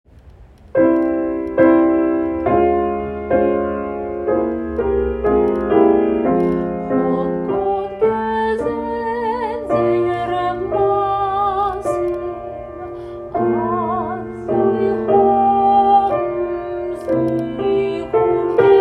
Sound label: Alto; Chapter 3 Pickup to m.123 Alto to End; Shirei Chesed Fall 2025 Recordings